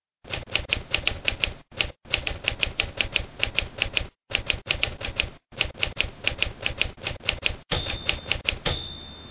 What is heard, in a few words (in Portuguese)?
maquina de escrever